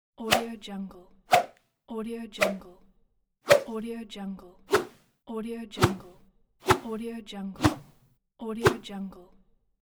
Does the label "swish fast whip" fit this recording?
Yes